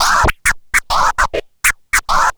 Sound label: Turntables, Loops